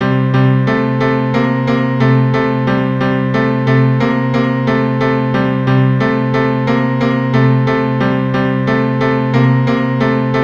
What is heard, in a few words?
keyboard